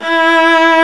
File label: arco viola; E-MU Formula 4000 Series Vol. 5; Protozoa; Proteus2 Presets